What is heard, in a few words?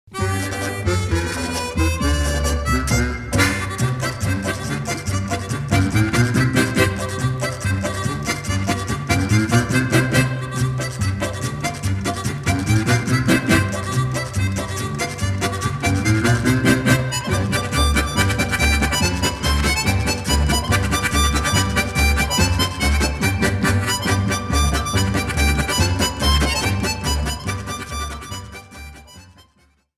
Western polka